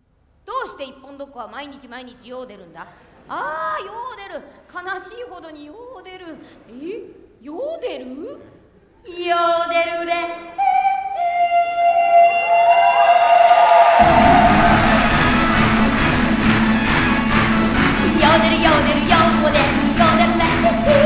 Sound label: Yodel; Sakura Taisen; Complete Song Box